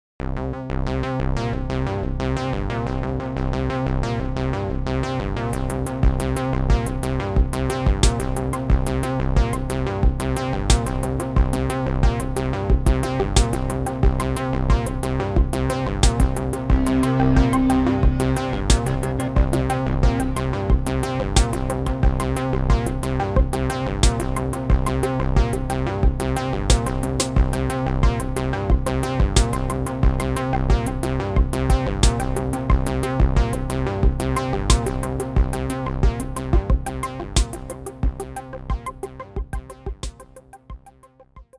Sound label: virtual analog synthesizer